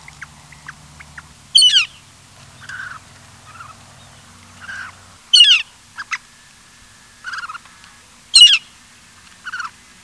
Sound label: flicker